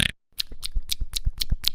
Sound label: pou eating